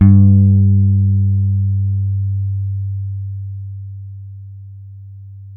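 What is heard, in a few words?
jazz guitar; Univers Sons; Jazzistic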